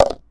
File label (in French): Office Gobelet impact Hard